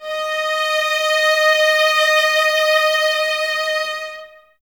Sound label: violas D#5, String Ensembles, Exprsive; Miroslav Vitous